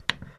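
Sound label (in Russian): кнопка клик резкий шумный в пространстве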